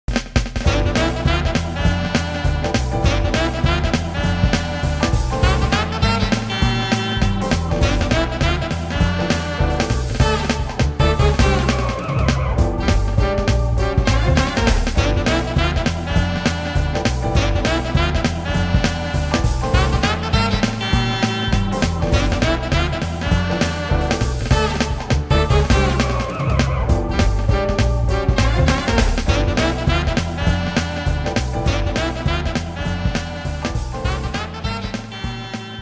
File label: Lever Pulling; Disney's Magical Mirror Starring Mickey Mouse; gc